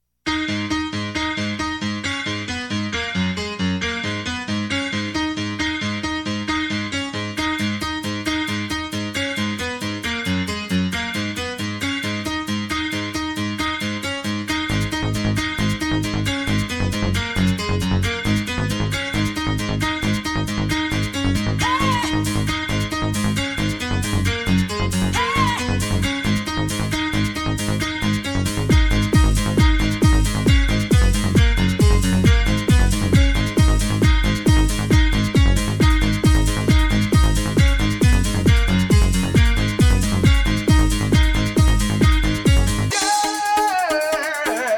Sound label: Trance